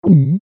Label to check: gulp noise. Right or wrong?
Right